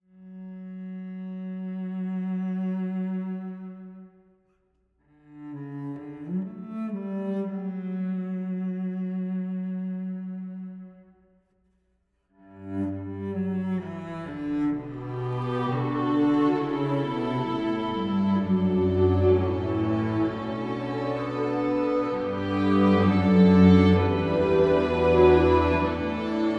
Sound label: Cello Solo